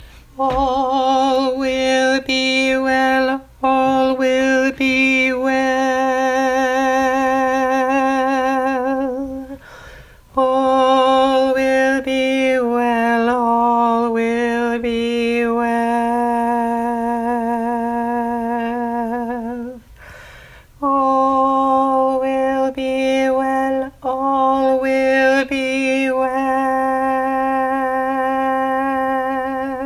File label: all will be well low alto bass